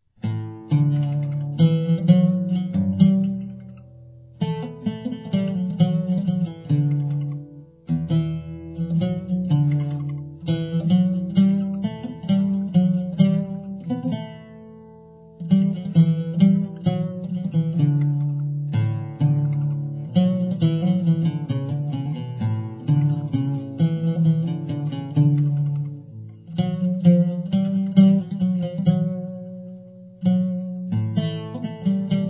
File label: rast pesrev pt, oud